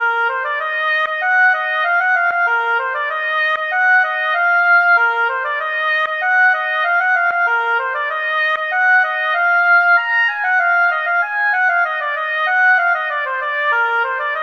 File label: D’Lëtzebuerger Scottish